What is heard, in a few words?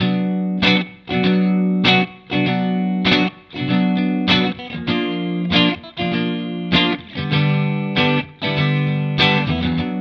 Fender both clean